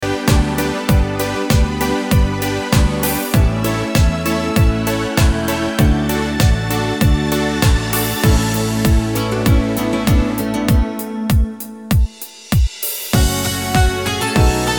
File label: cumbia